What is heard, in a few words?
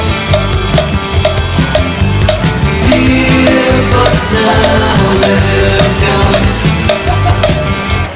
cowbell